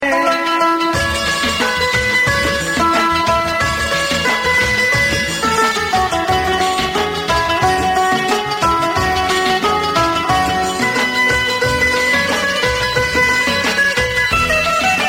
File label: Border Instrumental